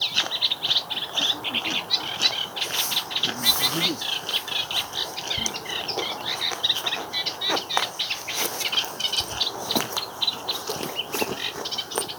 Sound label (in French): Rousserolle